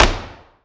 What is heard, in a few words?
STEP, SINGLE STEPS; Best Service; World Colours